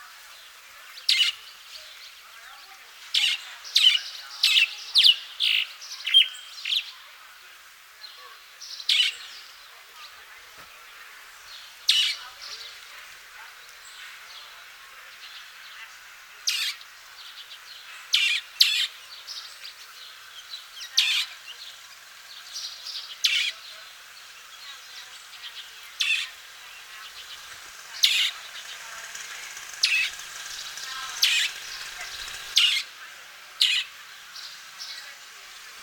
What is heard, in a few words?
Cuban martin